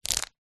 rubber band around rolled up news paper movement roll short; foley